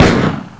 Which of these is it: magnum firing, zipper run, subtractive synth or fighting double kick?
magnum firing